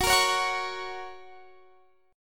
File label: Gb Major Gb down Guitar Standard; Gb strummed; JGuitar